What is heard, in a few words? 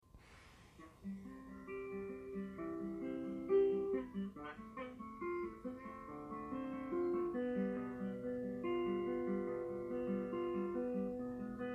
unplugged